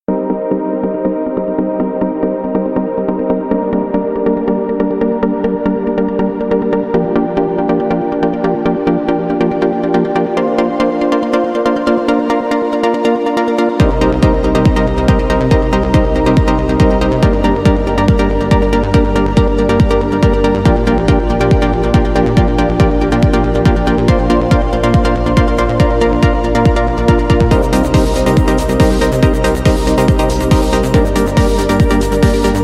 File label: quick pluck; rdg audio